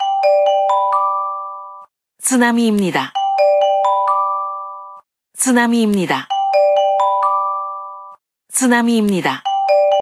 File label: etws tsunami; CellBroadcastReceiver; android 10.0.0; ko